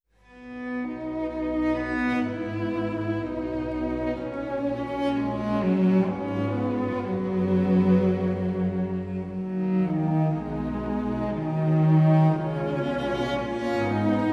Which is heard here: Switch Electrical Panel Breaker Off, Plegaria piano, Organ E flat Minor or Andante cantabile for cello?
Andante cantabile for cello